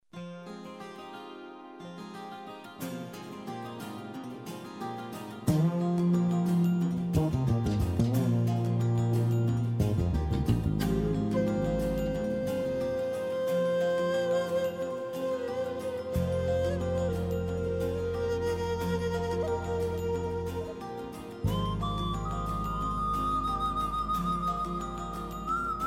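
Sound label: Nice Flute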